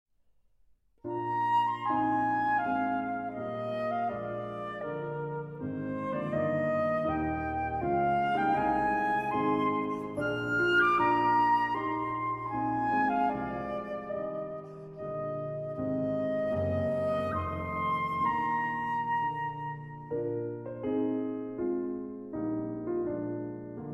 Sonata in E-Flat Major For Clarinet and Piano, Andante Con Moto; Op. 120 No. 2